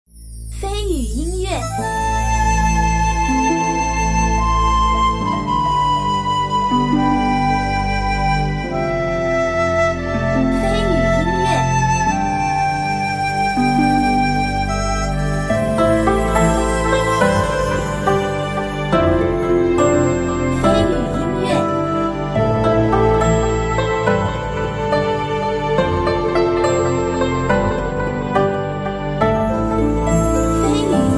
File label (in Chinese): 城镇 空旷 情怀